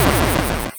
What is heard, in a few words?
Medium Explosion 8 Bit